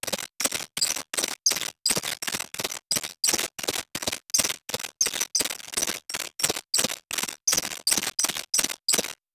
digital synth sound

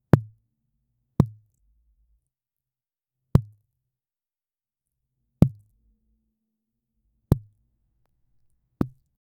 sound of a single small, drop

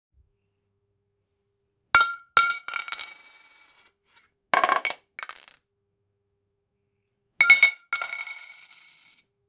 cookies falling on a plat; baking

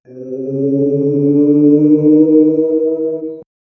a mating call of an, aliens

